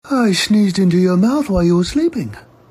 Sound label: Sneezing